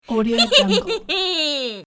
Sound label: evil laugh